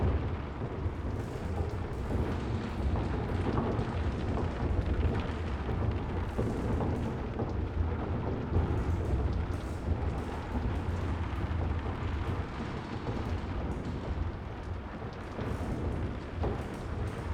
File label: atmos interior